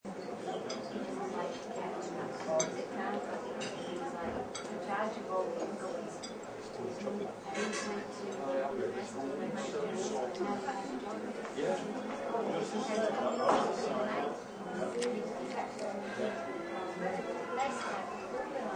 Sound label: Classy Restaurant, Classy restaurant ambience. Light talking, chatter, conversation and dini; Occasional sounds of glasses and cutlery; Lynne Music